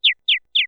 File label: Zombie